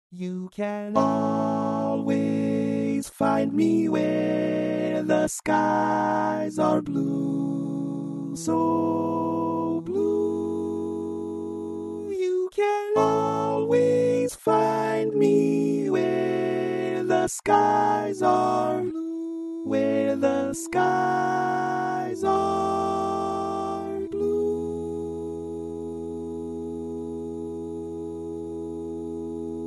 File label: Tenor